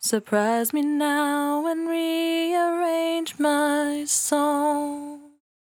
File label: 68bpm D, Velvet Vocal; Zero-G